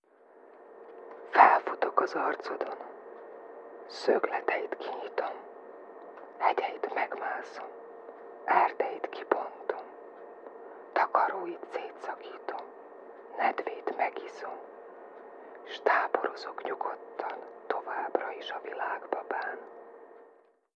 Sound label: best of disco